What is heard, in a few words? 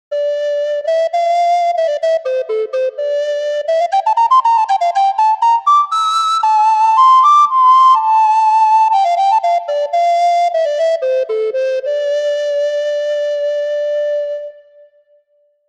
Flutophone; Kontakt Wind Paks